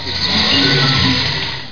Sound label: rattle; CNN